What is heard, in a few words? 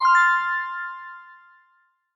solved